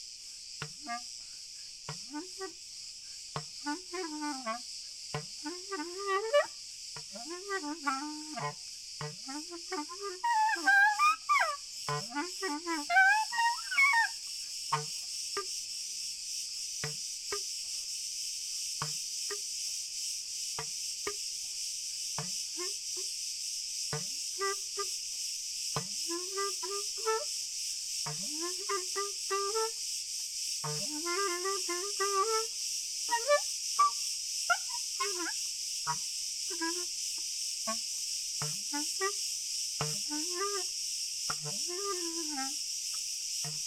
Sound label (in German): Schlag Ton Grillen